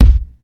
tight bass drum sample c key, bass drum sound tuned to the C note